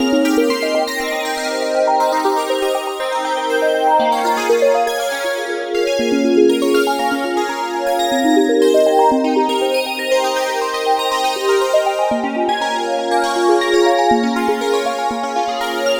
Wavelet Seq; Dr. Fink's Funk Factory

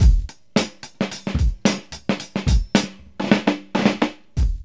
STREET BET 110, Funky Elements loops; Zero-G

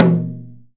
marudo, Japanese drum; AKAI S6000 CD-ROM - Volume 1; PERCUSSION

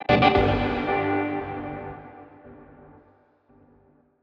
Guitar Chords Gmaj; Zero-G - Future Wave

